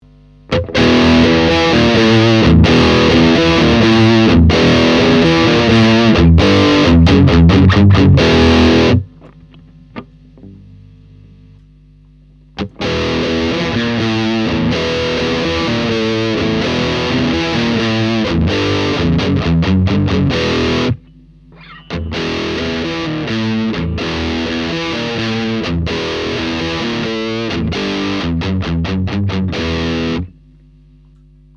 Peavey SM57 Drive; DiBox

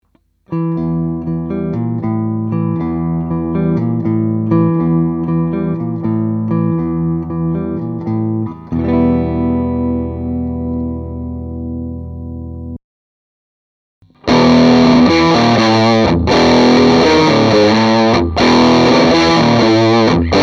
SM57; Blackheart; Shootout; DiBox

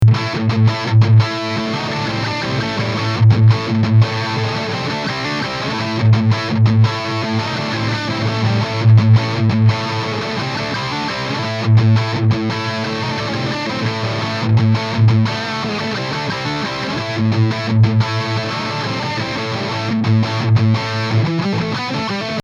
Crunch Rhythm - Vintage

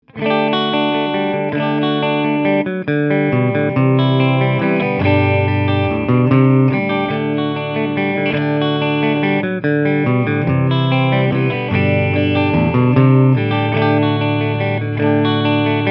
Clean New Tubes; Bugera 333

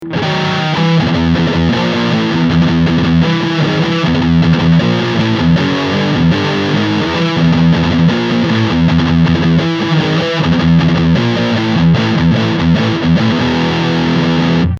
Battery Creamback 75; Vintage 30

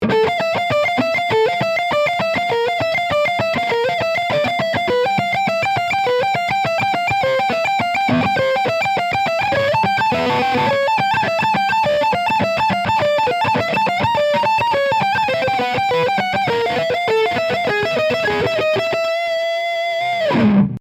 Lead Stereo Old Tubes; Bugera 333

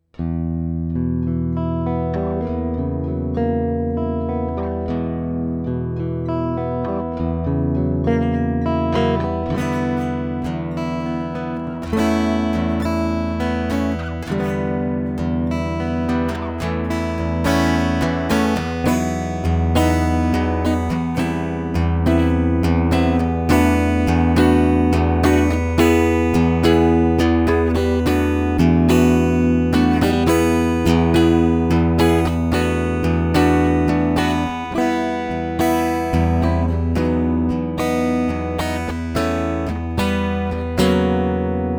E Lydian Noodle

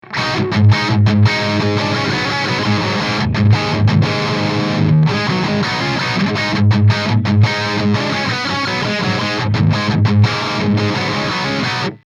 Crunch Rhythm - Gibson; Vintage

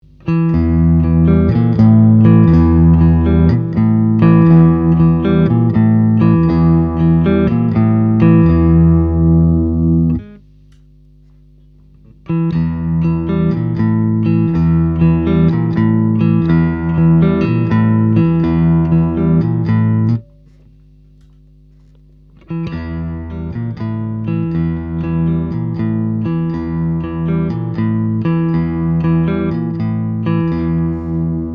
Peavey SM57 Clean; DiBox

